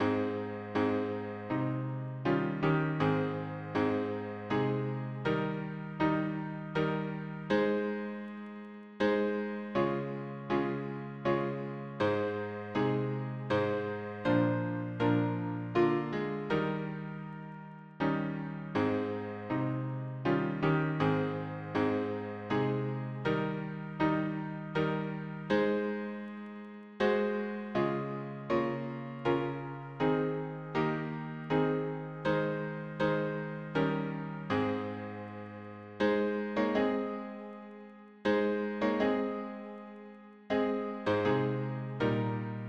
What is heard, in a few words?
harmony piano